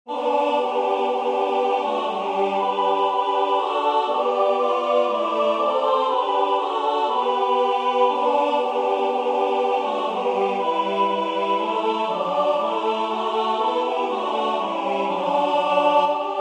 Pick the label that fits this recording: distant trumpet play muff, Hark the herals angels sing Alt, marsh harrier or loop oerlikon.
Hark the herals angels sing Alt